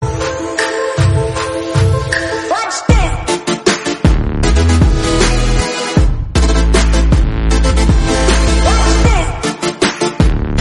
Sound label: apple iphone 8 ringtone